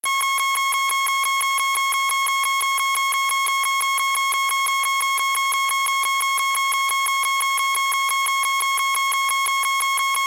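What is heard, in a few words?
alarm sounds; zvuki signalizacii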